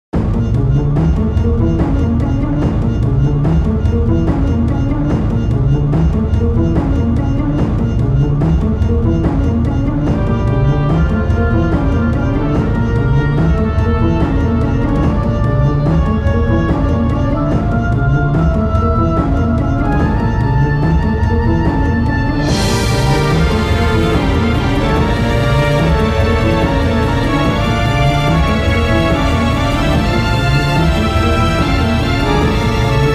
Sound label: bgm orchestra; maou